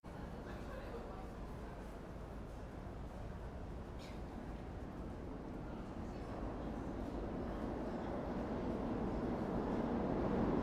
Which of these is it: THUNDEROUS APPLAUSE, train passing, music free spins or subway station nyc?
subway station nyc